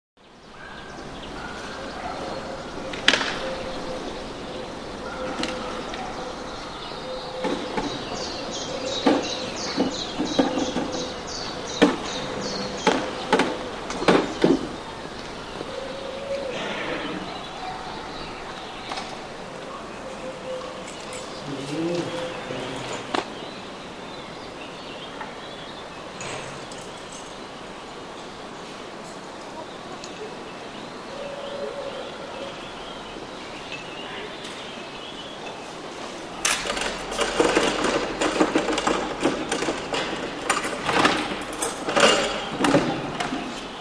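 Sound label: Town Street, With Activity, Morning, With Doors Opened And Closed, Distant Carpenter, Occ. Footsteps And Indecypherable Chatter, Bird Song Especially Wood Pigeon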